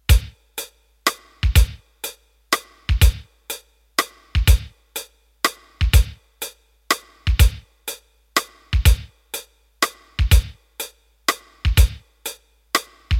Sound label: Jazz Waltz, Roland D-10; Rhythm Demos